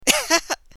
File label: laughter; Assemblee SFX